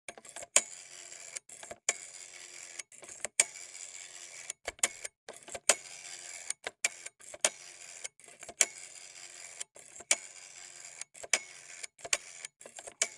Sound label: Rotary Phone Dial Noise